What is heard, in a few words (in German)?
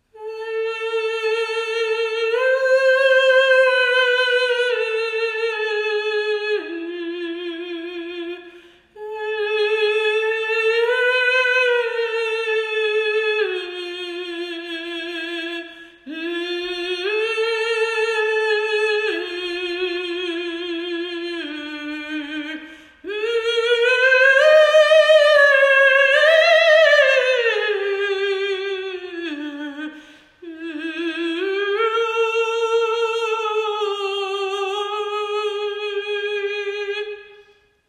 Gesang!